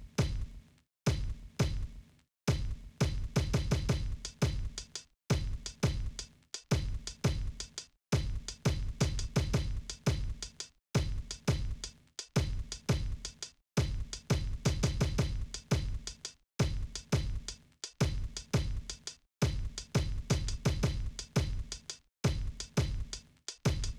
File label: drums; SiSEC11